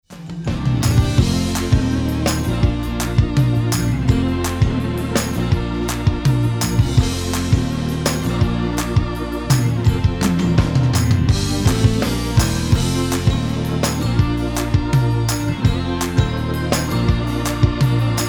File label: 432 hz